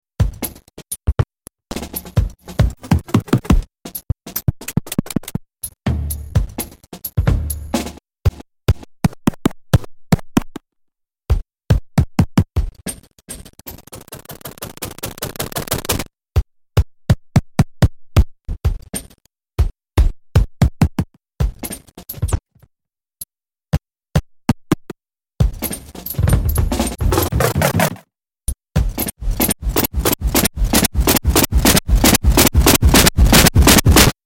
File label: short circuit trippy drums sound